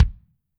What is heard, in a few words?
KICK, Drums Percussion